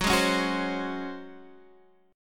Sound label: Db Major 7th Suspended 4th Gb